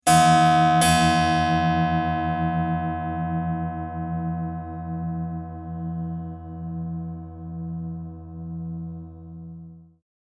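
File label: Pluck Nonlinear Resonator; simulations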